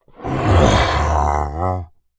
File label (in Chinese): 劫掠兽待机声